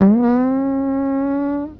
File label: fart horn; Farts